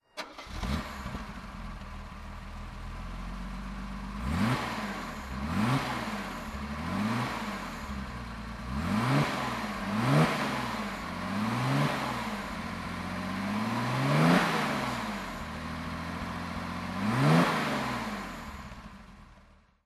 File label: mercedes 230sl throttle, Engine sound; Mercedes-Benz 230 SL 'Pagoda', 1964; V3D/ ClassicarImages